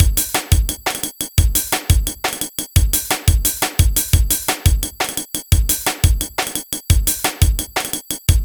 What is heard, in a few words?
Sound Drum and Bass Kit; NI; battery; Drum and Bass Kit Demo